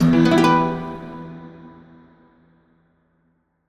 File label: harp